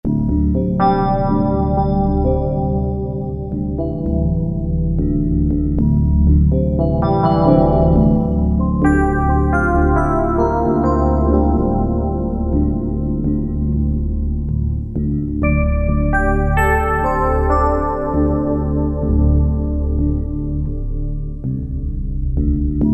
EP, Yamaha TG77; Factory Presets

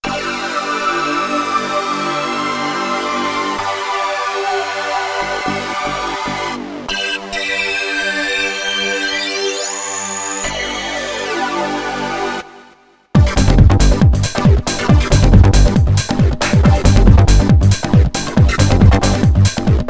Comb Stabber, Korg Radias-R

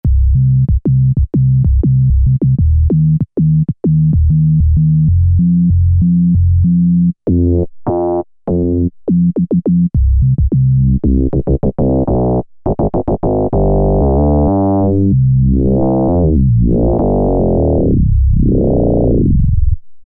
Zap Bass, Korg MS2000; Factory Patches

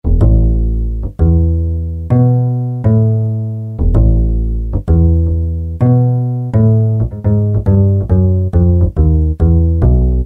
Ac. Bass, Roland U-110; Factory Patch

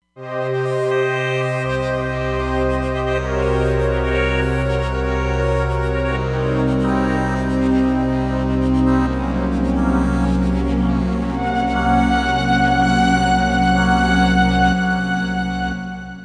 Beat Pad, Roland Fantom XR

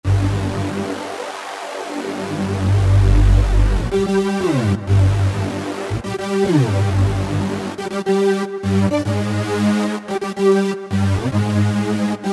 Whoover, Roland V-Synth; Factory Patches

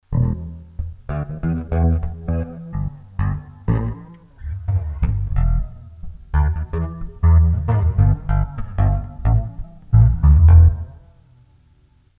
Funky Bass; Sony HR-GP5

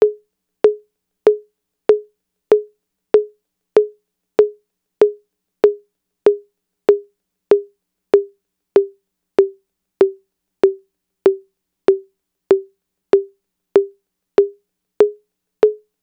TR-808 High Conga; Roland; Instrument samples

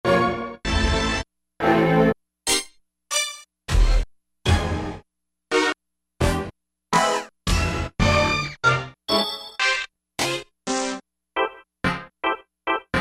hit-Da hits; E-mu Orbit V2; Factory presets

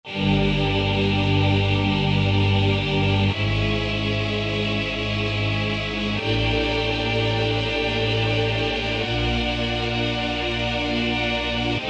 Tape Synth, Korg TR-Rack

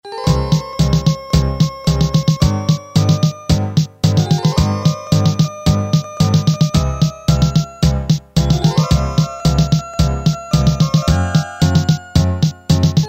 march, Casio MT-500 Rhythms

